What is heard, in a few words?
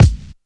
Kick